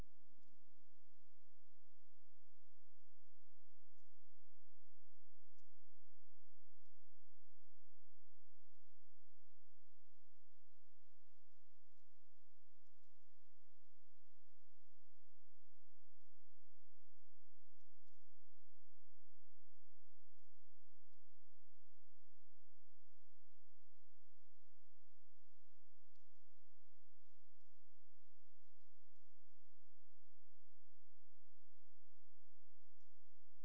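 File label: hydrophone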